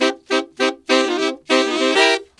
Sax Section in C7, Jazz; Sonic Foundry (Sony Creative Software); Jazz Solos and Sections

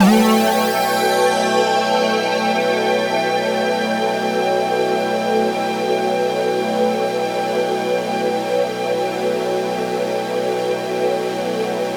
Long Atonal Pad

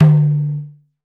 Madal Din, Roland BK-5 Percussion

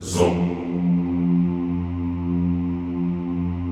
ZOOMMM F1, Classical Choir; Best Service; Extended Classical Choir

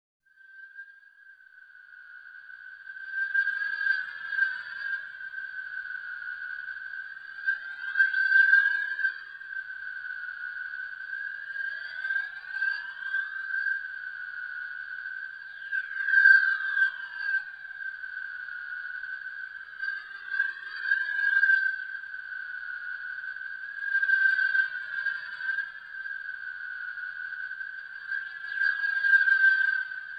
Panned Phasings; Bizarre Planet

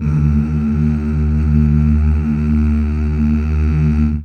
Mmh Male, CH BACK; Best Service Gigapack 1; CH BACK6

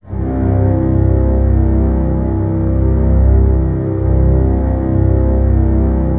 STR TREMOLO, Strings; ILIO; Kirk Hunter Virtuoso Strings VOL-3

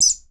BIRD CA, JUNGLE SOUND; Voice Crystal - Steve Reid Definitive Percussion

